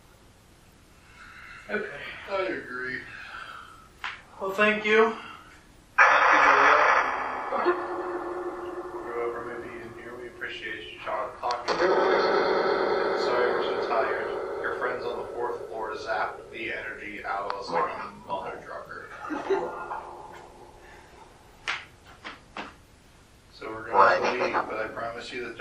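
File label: 1st Floor Doll Room Phasma Box; fairfield county infirmary investigation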